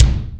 RY30 Kick; Drums; Yamaha RY30

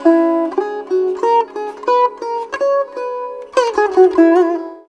SITAR; Spectrasonics; Heart of Asia

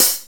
C OPEN RING, HATS; Zero-G; Funky Elements

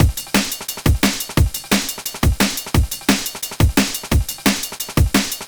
D&B Drum Loop; neuro

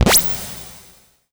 SHORT, SHORTNOIS

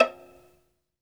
SOLO VI, Synclavier Strings; ILIO

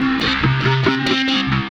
Metal guit; Leads And Riffs; Sonic Foundry (Sony Creative Software); Synchro Funk

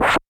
Scratch Push; Zoom RT-123

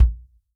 FUNK KIT, REAL DRUMS; Best Service ProSamples vol.43 - Real Drum Kits

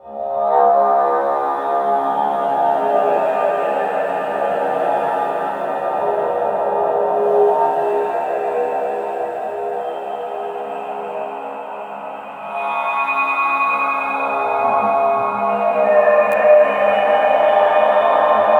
CHOIR METAL

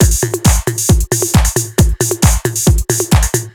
DRM, House, LOOPS 135; Best Service ProSamples vol.10 - House; AKAI

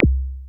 ELE BD, Drums Dance & Groove; USB Soundscan vol.11; AKAI; ELEC. KIT

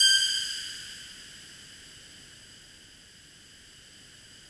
FOGATK3 G5, Yamaha VP-1; Music Store; 90's Sample CDs; FOGATTACK 3

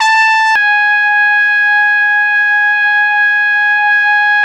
A4, Pop Brass; Best Service ProSamples vol.19; AKAI

